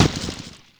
Volcano Rock Smash; Wii

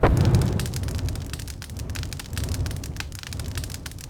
Bowser Jr Fire Ball Fire

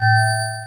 Bone Fish

